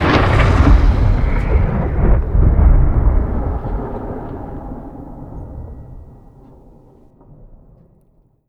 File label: abrams 120mm, weapons; weap abrams120mm sweet